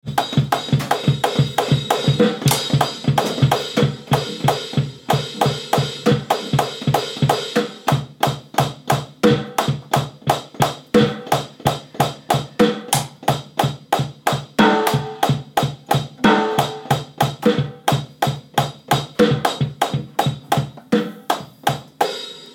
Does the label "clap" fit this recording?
No